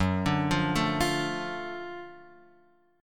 Gb Minor Major 7th Sharp 5th Gb, down, Guitar, Open F; arpeggiated; JGuitar